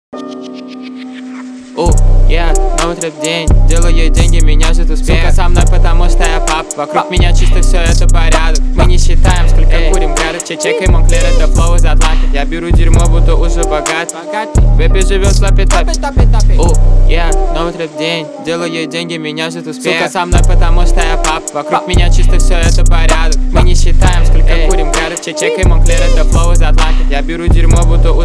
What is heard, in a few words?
trap